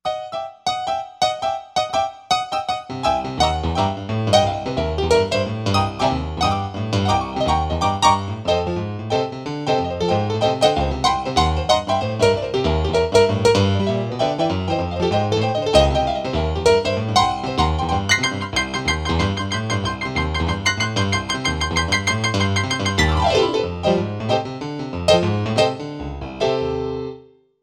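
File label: Piano Brite Rock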